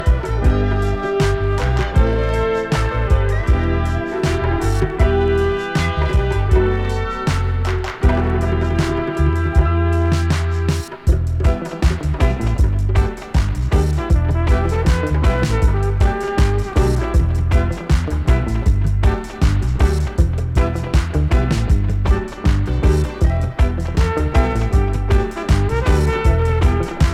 piano rock

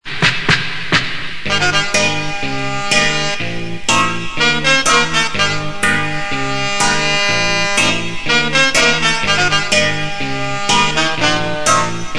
tribal drum

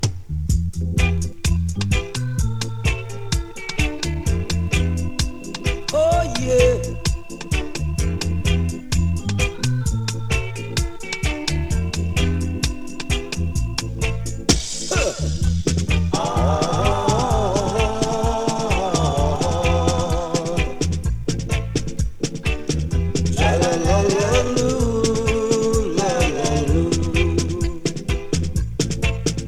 dub

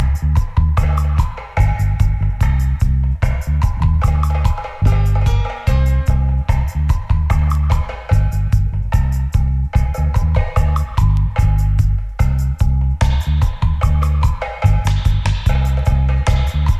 drum call

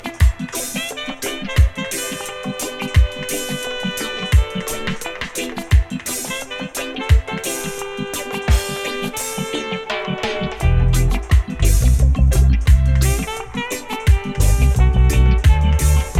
sax

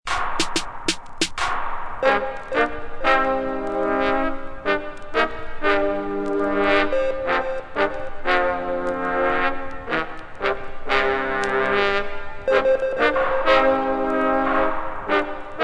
horns

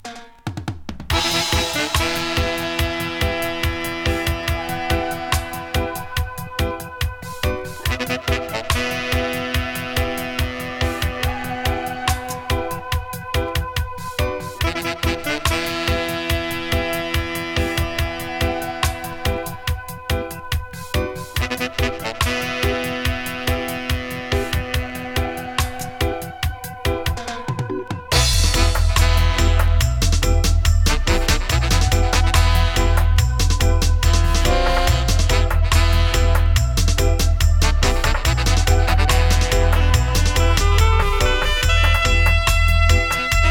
sax version

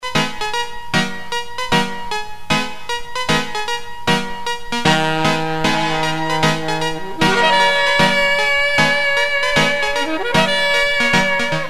sax

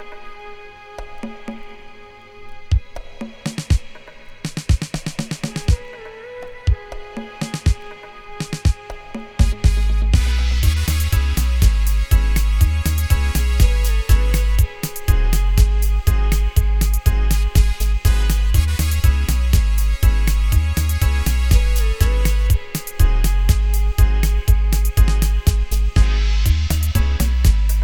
zulu march

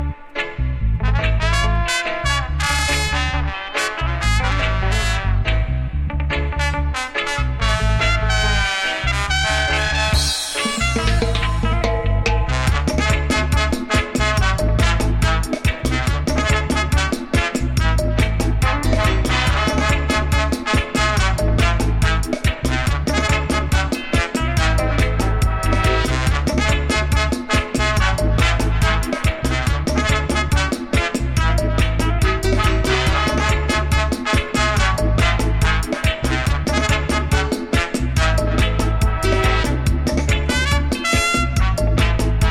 horns

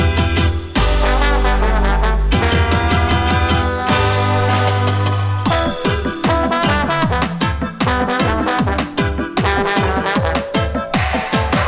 horns

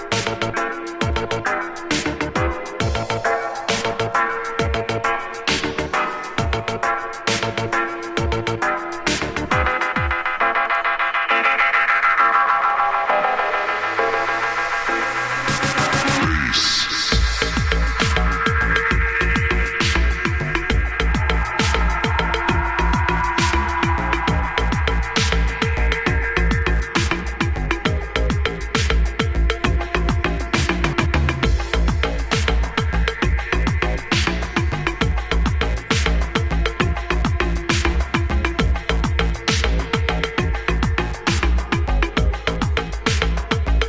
bass bassliner

